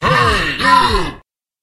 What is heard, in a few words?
monster cursed elite guard